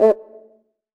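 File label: CUICA LOW, SR-16 DRUMS; EdgeSounds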